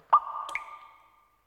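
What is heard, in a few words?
ambience water drip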